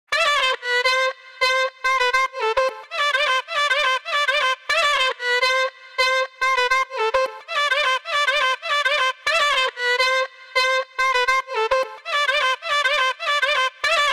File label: Drop Loop BPM 110 C Min; Gully Loops; Oye Hoe; UDD Gaye Sample Pack